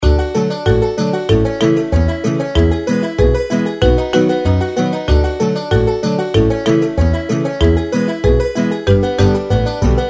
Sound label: farm reelspin bg; games; Farm Mania; Happy Farm